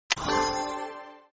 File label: Click icon